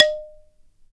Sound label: BALA, Balaphone